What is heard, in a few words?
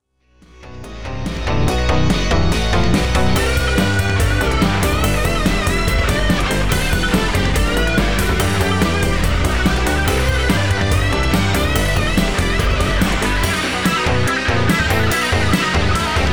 cymbal